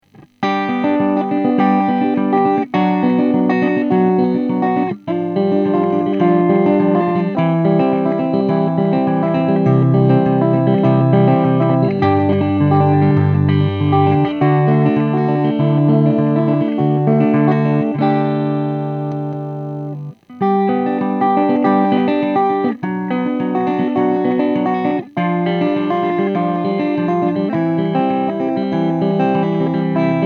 SH2 triode, Canal 1, SH2 position manche, EF86 en triode; WJ Voxy; ampli White Jack, un 3 canaux

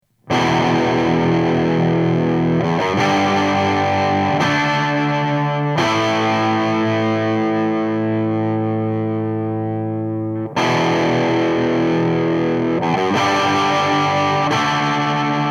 Canal 3, gain sur 7, SH4 bridge; White Jack, un 3 canaux; AMPLI

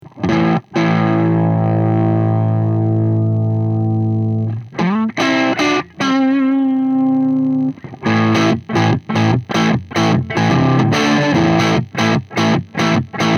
SH2 crunch, Canal 1, SH2 position manche, EF86 en pentode, satu de puissance (EL84); WJ Voxy; ampli White Jack, un 3 canaux

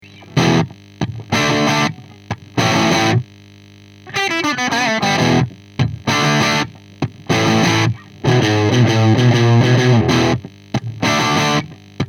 P90 lead, Canal 3 à fond, P90 bridge; White Jack, un 3 canaux; AMPLI

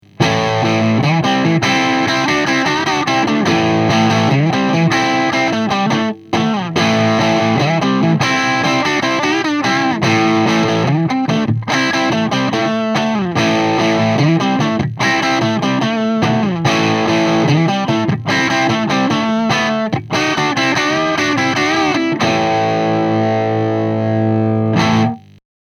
P90 crunch, Canal 3 gain à 6-7, P90 bridge; White Jack, un 3 canaux; AMPLI

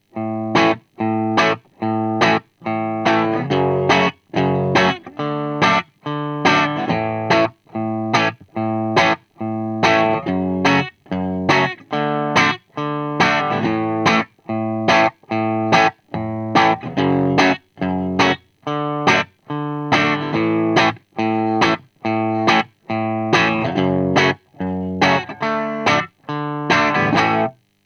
P90 pentode clean, Canal 1, P90 position bridge, EF86 en pentode; WJ Voxy; ampli White Jack, un 3 canaux

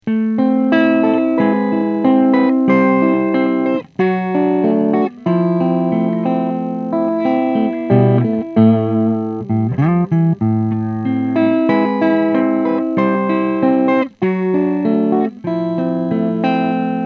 SH2 pentode clean, Canal 1, SH2 position manche, EF86 en pentode; WJ Voxy; ampli White Jack, un 3 canaux

